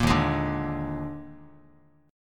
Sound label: Eb Major 7th Suspended 4th Eb, Bass Standard; strummed; 18,6,18,7; EbM7sus4